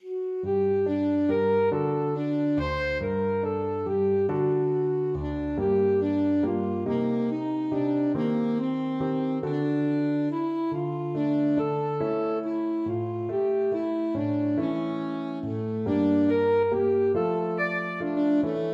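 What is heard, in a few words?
alto saxophone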